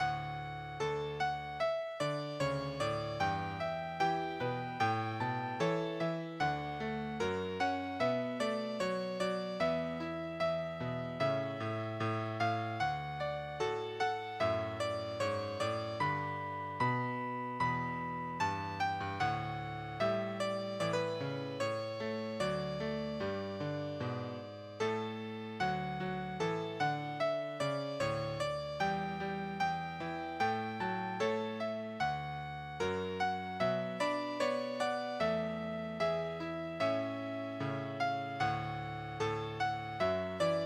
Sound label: Classic Piano; midi; traditional